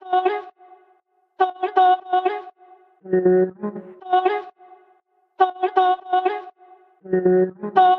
Vocal, Dancefloor Pop; Remixlive

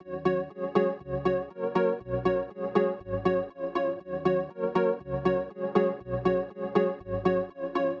Chords, Dancefloor Pop; Remixlive

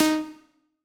Lead Hit, Dancefloor Pop; Remixlive

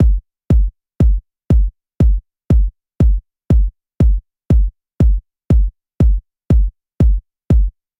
Kick, Dancefloor Pop; Remixlive

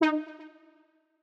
Chord Hit; Remixlive; Dancefloor Pop

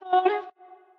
Vocal Hit, Dancefloor Pop; Remixlive

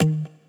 Lead Hit, Dancefloor Pop; Remixlive; FreePacks

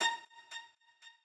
Lead Hit, Dancefloor Pop; Remixlive

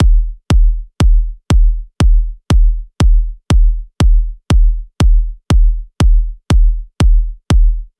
Kick, Dancefloor Pop; Remixlive

